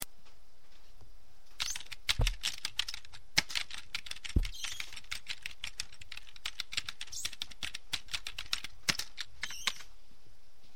typing

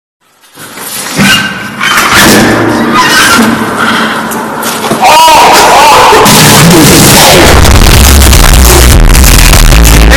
hard slow

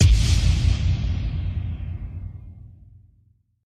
choice button sound; the voicechair

